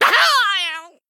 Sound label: Male Goblin Death